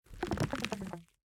TV One Shot Retract; Forest SFX

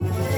Music Fight Brigands Theme Hard Bpm160 Start; asterix obelix slap them all 2

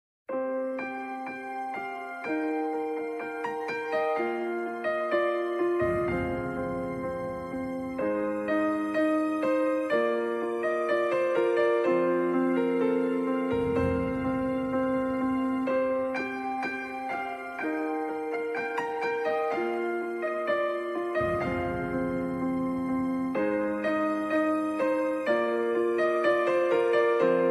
The Piano; Pocket World 3D

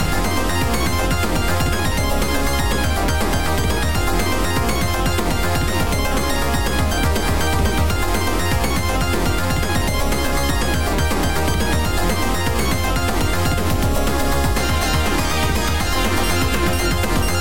A Critical Battle; Angry Video Game Nerd 1 & 2 Deluxe

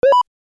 Jump; Cheems Jump

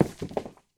Rock Impact Medium

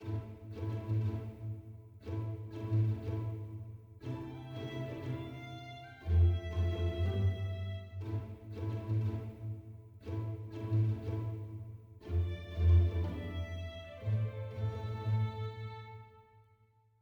heroic soft

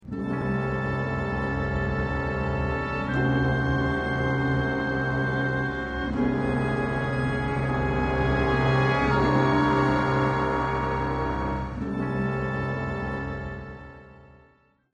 Ident Tense; Doctor Who Adventure Games Episode 2

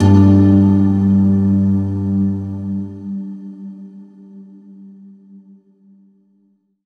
level transition; Knight Swap

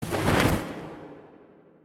Teleport In; Grimrush

